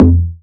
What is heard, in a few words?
urban tom one shot f key; tom drum samples; one shots